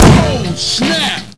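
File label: grenade launcher, weapons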